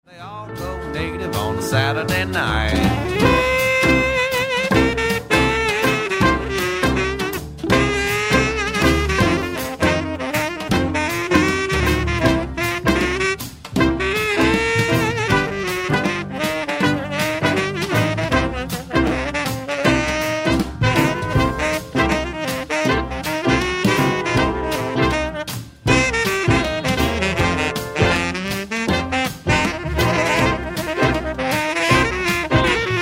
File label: sax